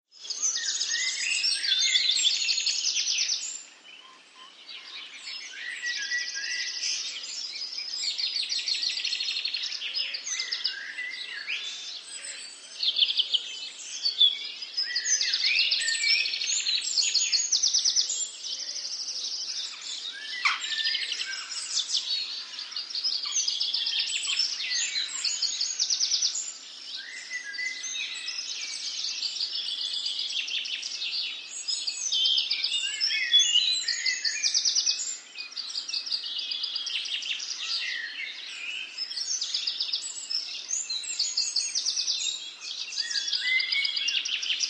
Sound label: ANIMALS-BIRD BGS RURAL ENGLAND: April dawn,, many busy bird calls, distant rooster call, Wiltshire; Brixton Deverill